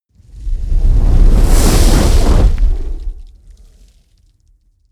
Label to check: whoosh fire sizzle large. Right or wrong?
Right